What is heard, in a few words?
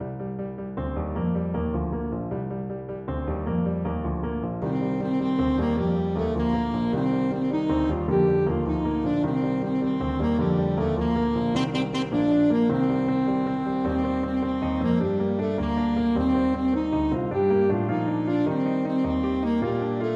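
follow me to carlow, alto saxophone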